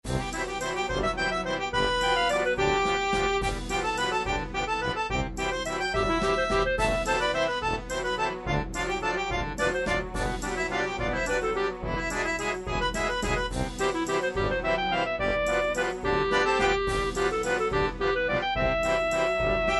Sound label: folk polka, midi